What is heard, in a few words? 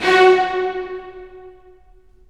STR, Full Short; Roland - LA Composer vol. 4